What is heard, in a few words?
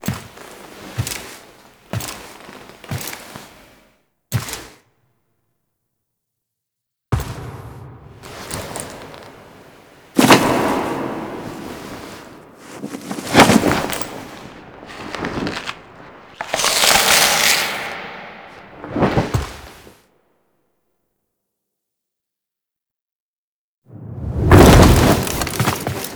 Foley; Good End; Warframe; Nineteen Ninety Nine; Quests; Hive Queen